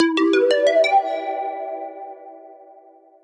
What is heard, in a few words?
Jazzy Pluck